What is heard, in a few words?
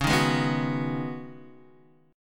Db 7th Suspended 2nd Suspended 4th, Guitar, Open D